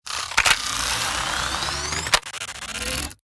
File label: investigate; trash collection